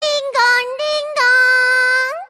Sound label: ding dong